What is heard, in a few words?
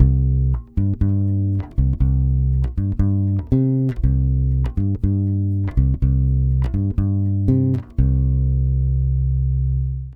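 120 BASS C minor, Brazil Bossa; USB Soundscan; AKAI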